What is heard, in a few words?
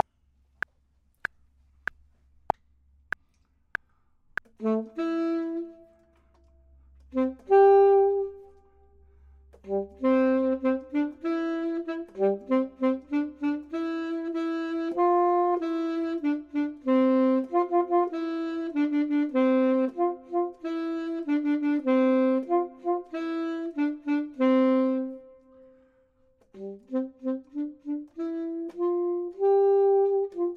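top, Saxophone and Clarinet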